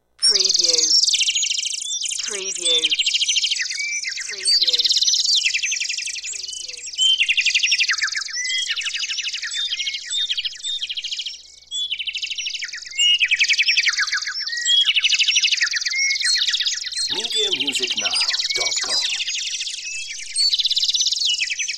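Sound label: Birds Chirp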